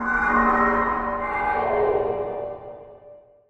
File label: ability purge